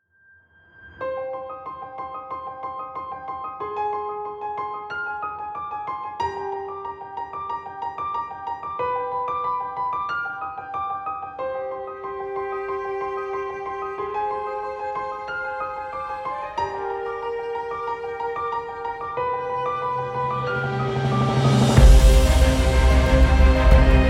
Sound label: cinematic epic emotional